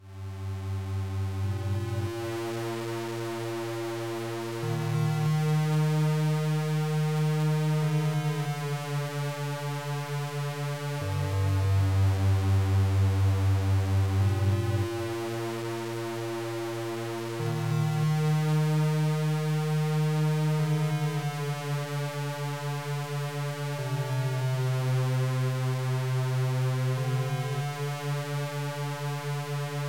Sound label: C64 Chorus; stay a while